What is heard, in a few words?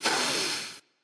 nv breath; smite; xbalanque